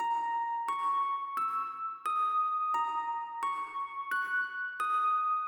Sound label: Piano Strange